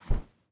throw; teardown; ps5 windows xbox series xs

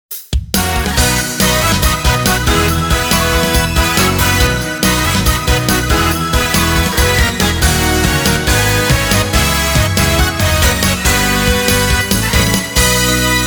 Prize Jingle

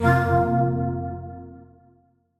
Duel Entry Coin Tail

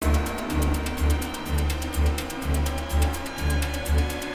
Low Music; Alien Breed Trilogy; PS3; Xbox 360

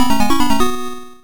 High Score Update; Guardic; msx

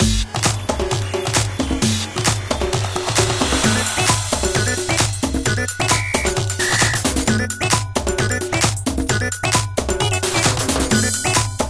Compete; treachery in beatdown city

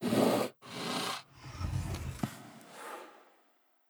cover slide

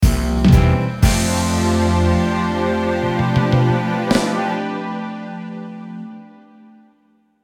Win Fanfare; Sockventure

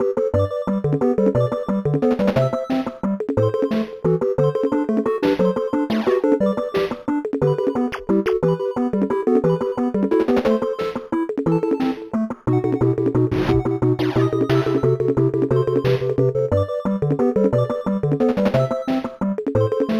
Drawing minigame BGM; Counting on Zero; Leapster

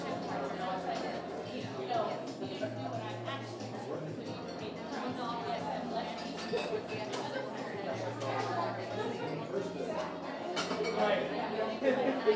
Ambient Diner; Levels Hub Restaurant; Act Kitchen; Ambient Diner Loop

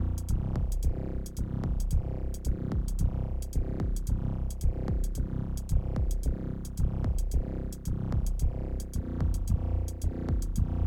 music menu loop; Enyo

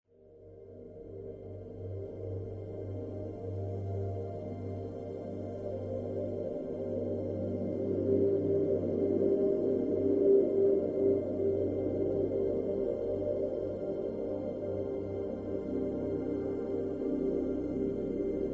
Small disco; O.R.B Off-World Resource Base

